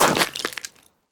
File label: ice land; Golden Crayon Sounds